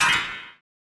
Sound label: surprise